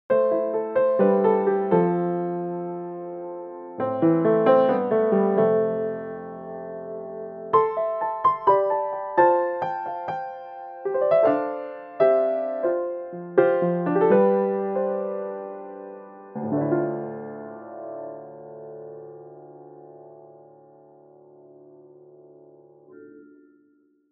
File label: Ragtime Piano; Kontakt7